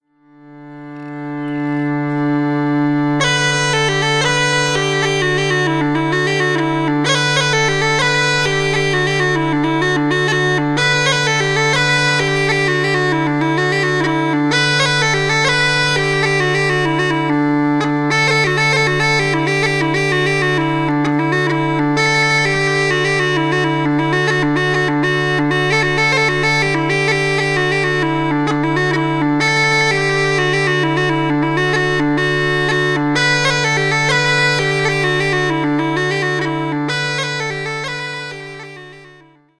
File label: jig; boda wennol